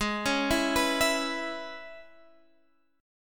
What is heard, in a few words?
E 6th, G sharp